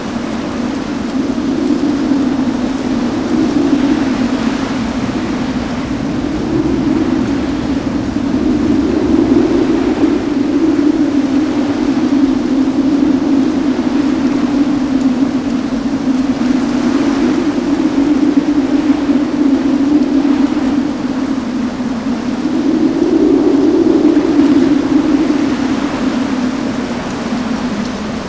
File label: sea wind; quake2; kitsune; okami